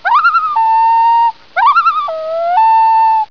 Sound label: loon